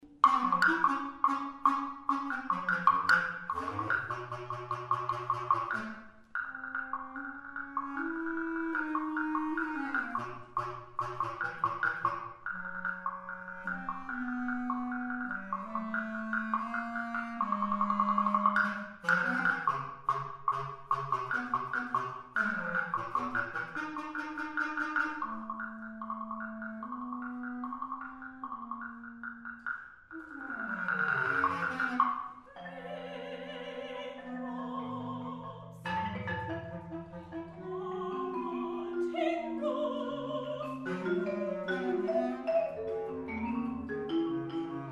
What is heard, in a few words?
trio